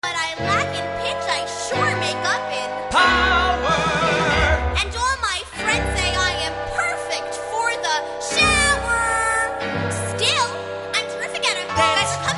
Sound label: chorus